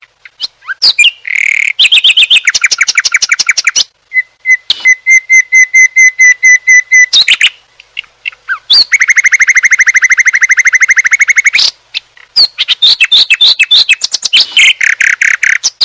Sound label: Bird Sms